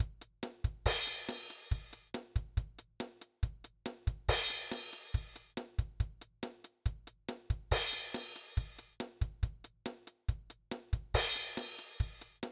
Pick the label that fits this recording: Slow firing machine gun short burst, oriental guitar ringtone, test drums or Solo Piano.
test drums